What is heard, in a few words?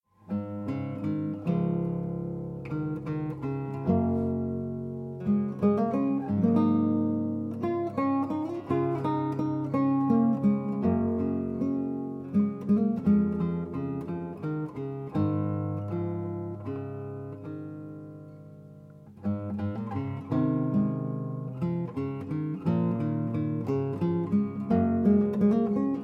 guitarscape